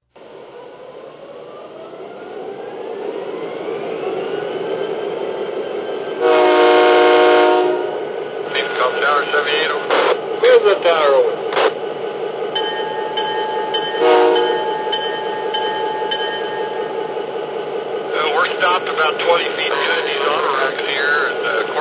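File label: GP35, locomotive; CP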